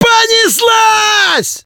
Fight, Human; customnpcs

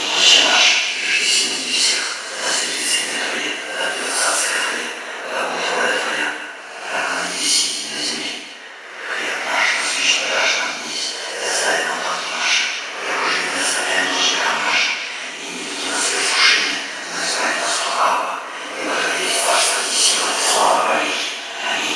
ghost; metrocraft; npc

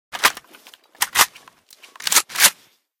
saiga load, weapon; metro; unload

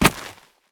gravel footstep

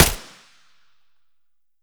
rpd silencer, weapon

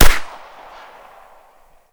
gurza silencer, weapon

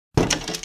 wooden Crate Open

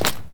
mud footstep

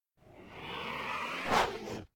shrimp Agr

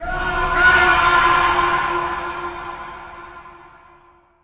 pipe cry

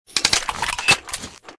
abzac unload, weapon

